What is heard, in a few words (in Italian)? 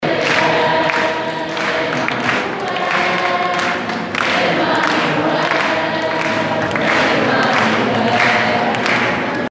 Coro, Emmanuel